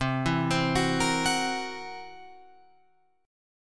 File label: C 7th Flat 5th C down Guitar Open F; C7b5 arpeggiated; JGuitar